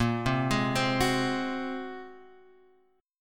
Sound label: A sharp 7th Suspended 2nd Sharp 5th, guitar chord